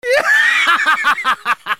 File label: Insane Laughter